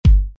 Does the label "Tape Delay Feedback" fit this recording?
No